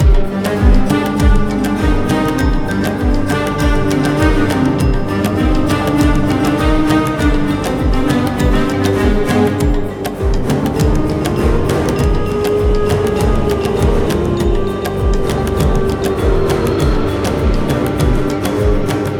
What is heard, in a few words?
freespin music; godsofgold